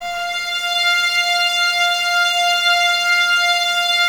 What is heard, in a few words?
violins F 5, string ensembles; Miroslav Vitous; 11 Violins